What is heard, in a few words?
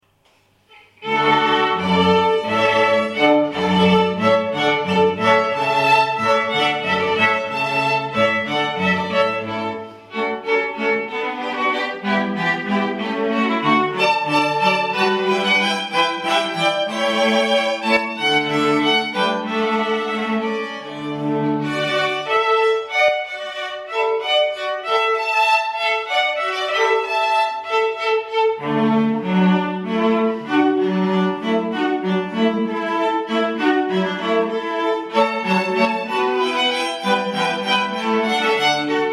Hornpipe